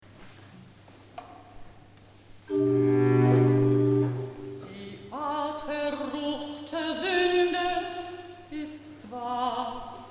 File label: Recitativo A; BWV054 Widerstehe doch die Sünde